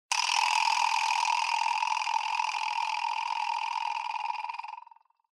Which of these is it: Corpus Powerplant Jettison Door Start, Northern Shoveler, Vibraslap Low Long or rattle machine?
Vibraslap Low Long